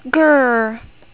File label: grrrr